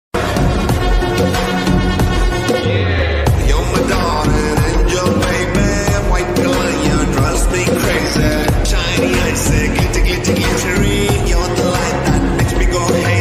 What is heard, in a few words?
Lofi ringtone